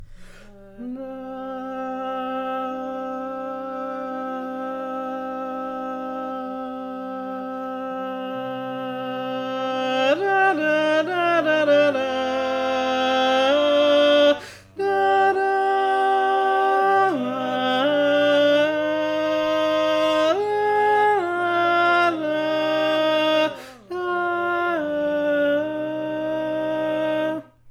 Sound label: Tenor, barbershop tag